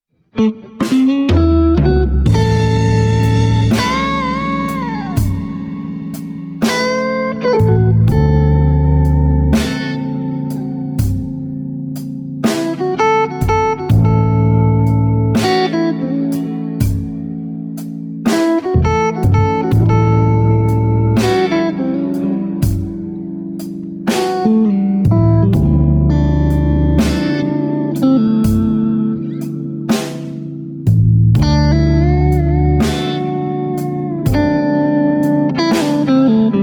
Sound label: blues licks